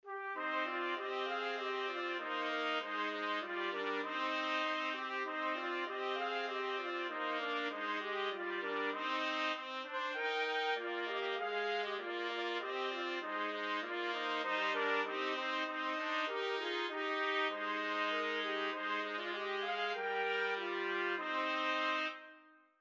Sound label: trumpet trio